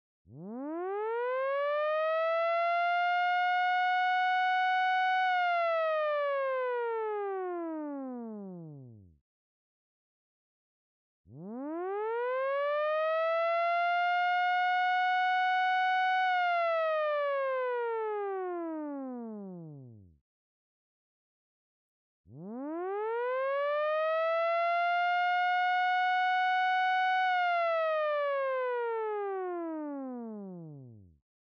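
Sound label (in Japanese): hinanjouhou sairen, 避難情報 屋外スピーカー; 避難情報サイレン音; デジタル防災行政無線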